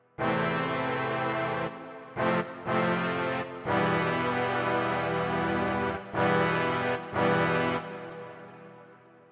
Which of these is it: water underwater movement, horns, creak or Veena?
horns